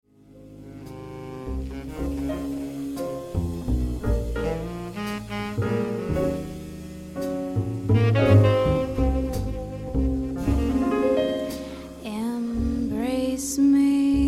Vocal, Ballroom Dance Music Band

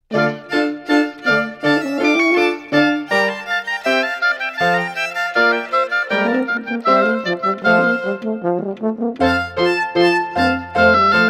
Quartet in d minor Allegro, chamber music quartet

